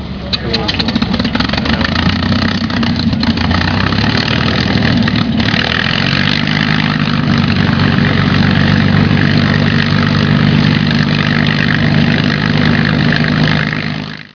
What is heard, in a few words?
P-51 Mustang Start Up